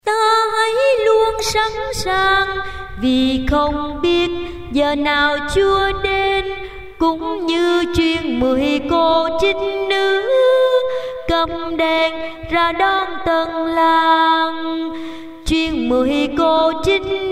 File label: alto basso